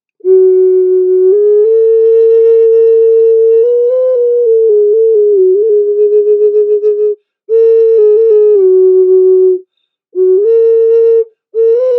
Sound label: chickenhawk, Hawk Ocarina; Hawk Ocarina Sound Healing Instruments Black Clay Pottery; First Nations Music